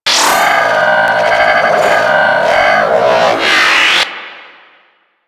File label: Cluster Shot Projectile Loop; Enemies; Abilities; Warframe; Teralyst; Bigalyst